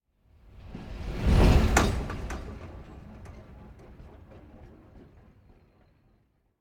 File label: Train Short High Speed Passing European Train Type - L to R; Hollywood Edge; sound designer's toolkit v2